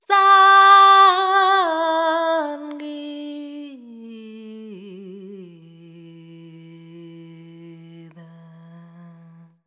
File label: ETHNO FEMALE, Vocals; ethno2